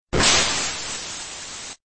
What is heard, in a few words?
blast